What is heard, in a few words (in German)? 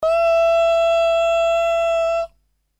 Klingelton Echoortung